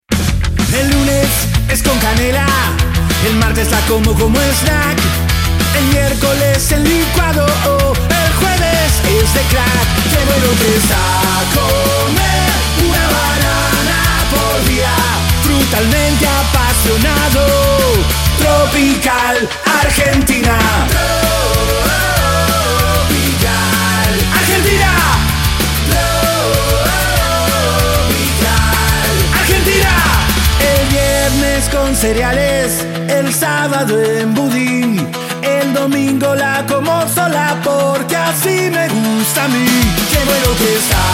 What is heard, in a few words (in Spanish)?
ROCK Tropical Argentina jingle